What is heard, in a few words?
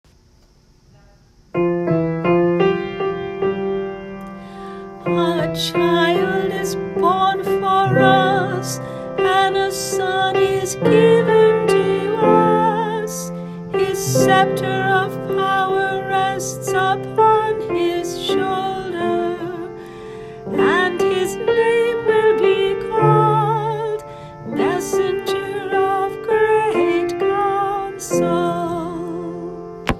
Antiphon; Christmas Day